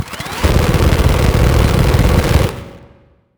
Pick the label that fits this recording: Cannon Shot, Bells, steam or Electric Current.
Cannon Shot